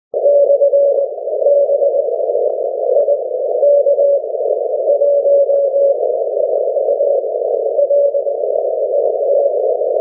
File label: 6m cw